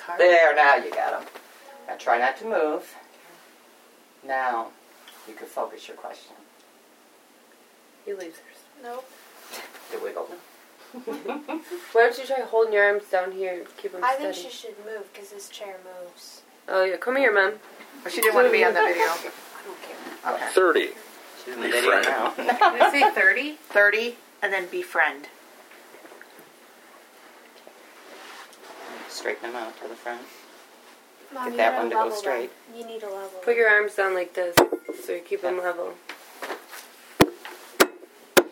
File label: giggle; howell residential